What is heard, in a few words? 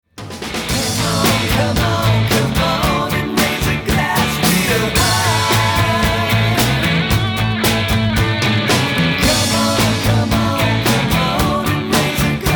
Jingle; High Glass